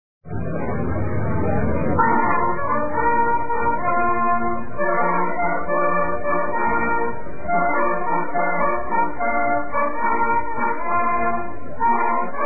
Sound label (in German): halali